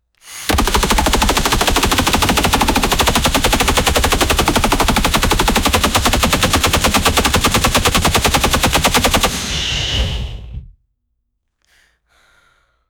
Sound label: superhero super speed run